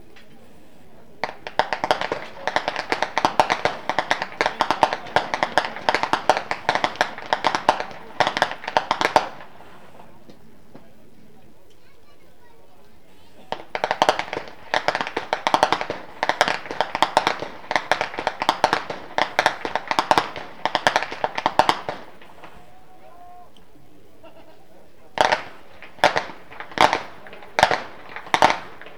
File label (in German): schnalzen, flachgau; muntigl